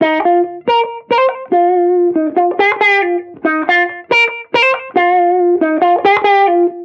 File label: Reggae Guitar Loop 140 BPM F Maj; Vibrations; Cymatics; Vibrations Guitar Sample Pack